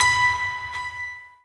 piano; osu

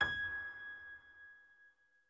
piano low

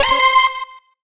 synth; osu

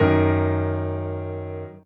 soft hitwhistle

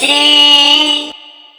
normal hit whistle; osu

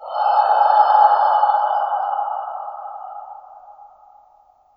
Exhale

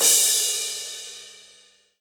crash; osu

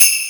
BELL

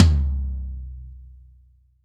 Low Tom

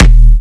punchy kick; osu

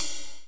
ride

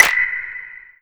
Chime Clap

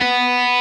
N.H.

